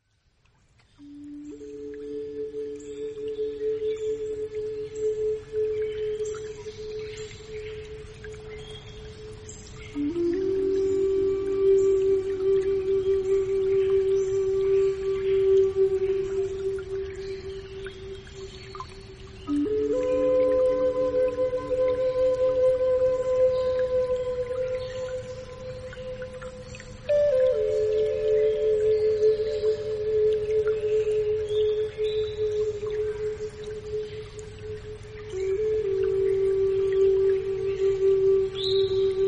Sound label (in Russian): indejskaya flejta i zvuki lesa